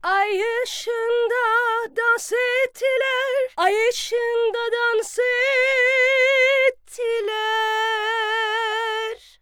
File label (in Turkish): Şarkı